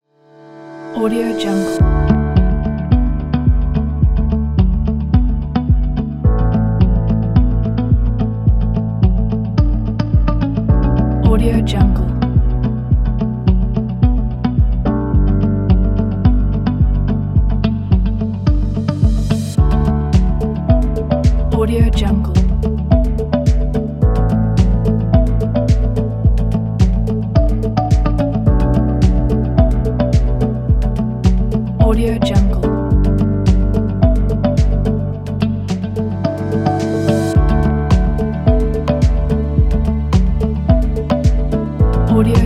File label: ambient corporate background